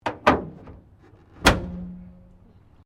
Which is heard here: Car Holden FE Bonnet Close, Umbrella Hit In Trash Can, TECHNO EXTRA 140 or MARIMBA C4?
Car Holden FE Bonnet Close